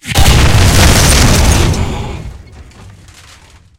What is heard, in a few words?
tank hit aphe big; tanks; War Thunder